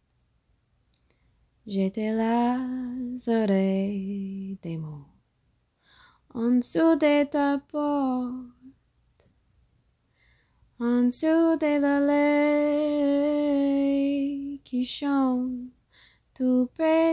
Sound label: Just having fun learning a new song